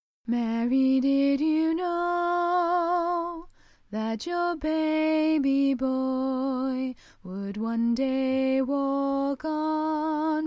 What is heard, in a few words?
tenor, choir